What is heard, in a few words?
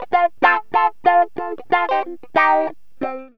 GTR, CHANK; Zero-G; Funky Elements